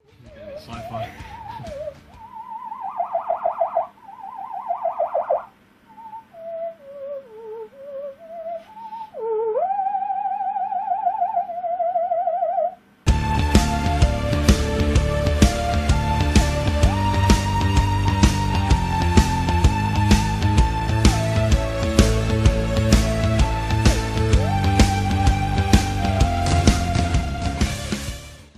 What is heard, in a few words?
Ondes Martenot; chez Muse